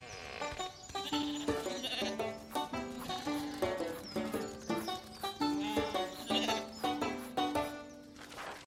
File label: banjo guitar; children; shaun